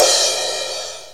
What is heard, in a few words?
CRASH, Kawai R-100 Drums